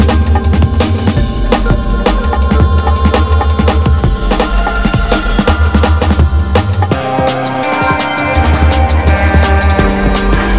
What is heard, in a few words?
drum sync